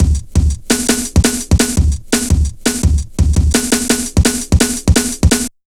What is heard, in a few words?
Steppa, Jungle, Drum Loops 169.5; Zero-G Jungle Warfare 1, 2 & 3